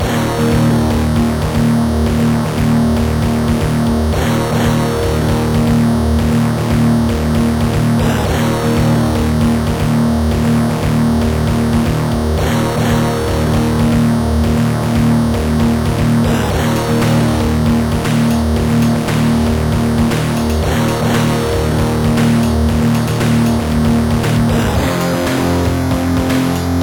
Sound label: sfx heavy metal; SoundFX 1.3